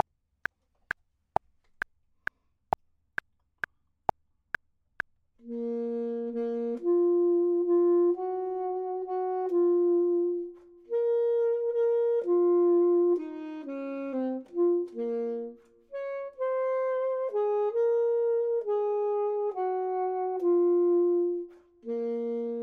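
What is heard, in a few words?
bottom, Saxophone and Clarinet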